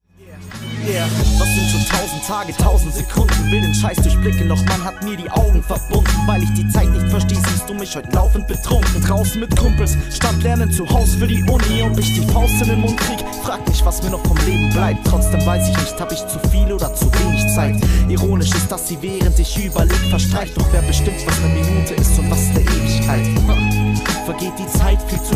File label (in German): Hoerprobe Rap